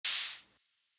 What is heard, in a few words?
Washed Crash; Vignette Kit